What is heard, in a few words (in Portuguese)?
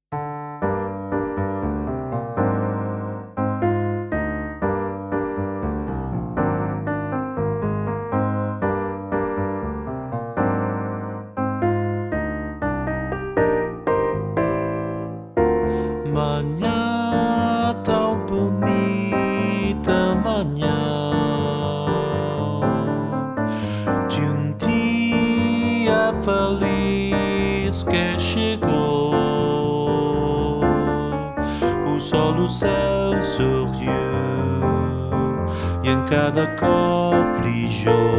manha sop, ADIOSES SHOW CHOIR